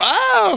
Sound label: scream